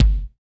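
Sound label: bass drum, bass drum dance; 90 s Sample CDs; Sound & Vision - Gigapack I CD 1 (Roland); Dance-Kit 14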